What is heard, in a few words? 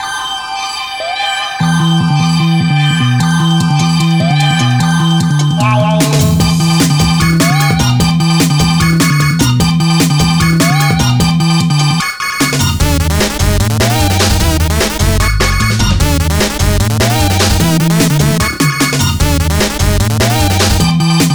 Micro Rave